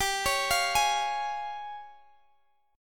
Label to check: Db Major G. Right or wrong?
Right